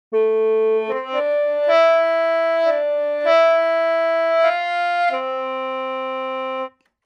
Sheng Sustain Legato; Phoenix Orchestra

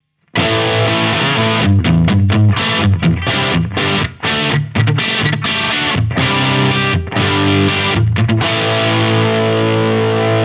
VOX Flat 4 Booster; Sound City

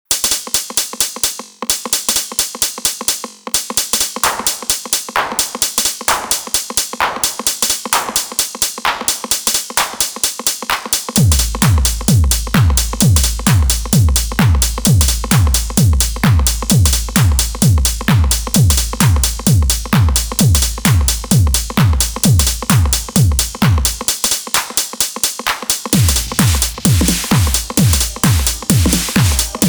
Beat

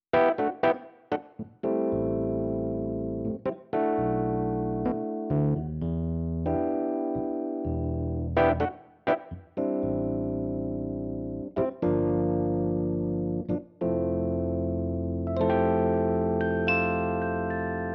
E Piano

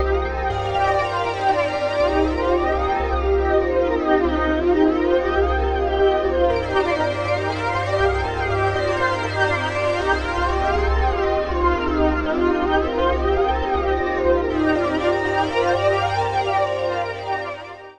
Sweeper Modulation Effect Flanger Modulated; Reason Studios Reason 11

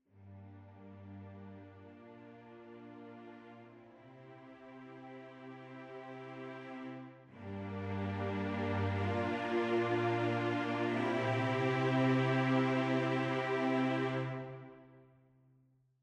Full Ens Sustained Far Mic; Audio Imperia Areia

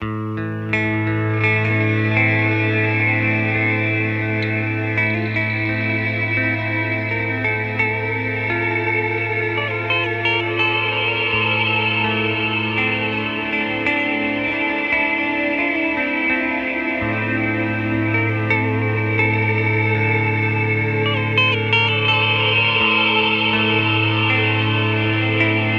EQD Afterneath Reverberator

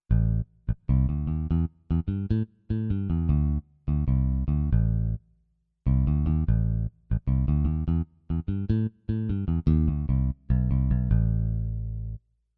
CTK4400 Bass

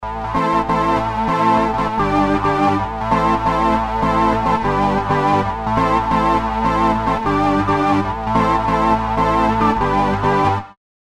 Organ Synth

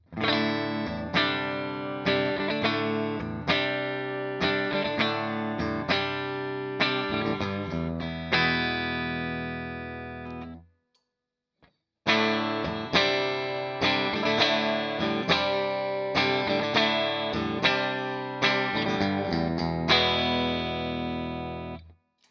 Clean

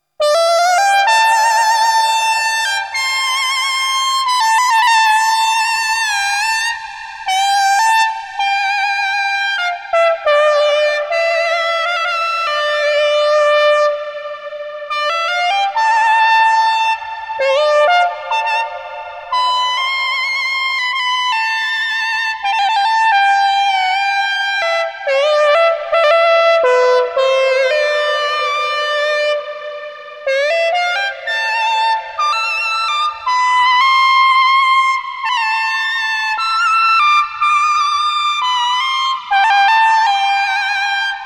Enhancia Neova Solo

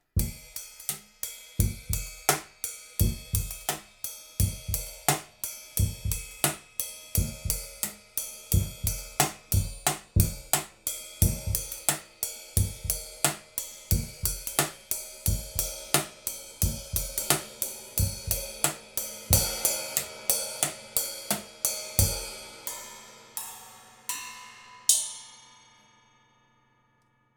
Drums; LCT-040

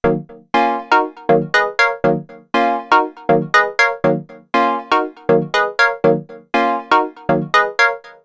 Korg minilogue xd

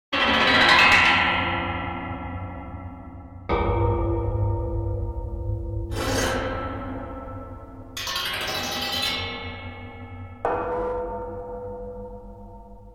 Sound Effects

